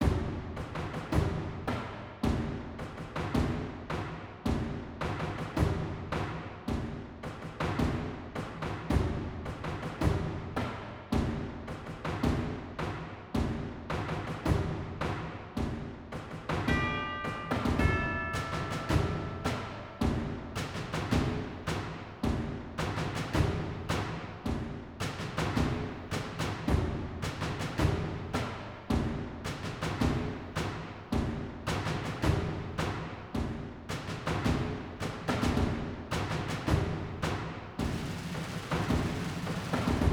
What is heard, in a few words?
Percussion Crawl; Mission To Zyxx; Season 1